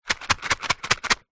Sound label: household matches box shake